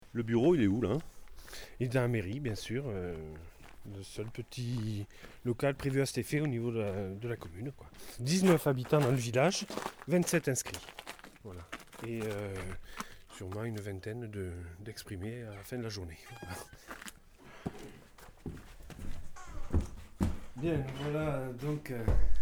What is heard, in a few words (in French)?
ambiance vote